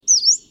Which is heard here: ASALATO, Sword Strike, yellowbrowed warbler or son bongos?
yellowbrowed warbler